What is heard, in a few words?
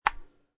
Ping Pong Table Tennis Bat Hit Ball; Ping Pong (Sound Effects:Sports)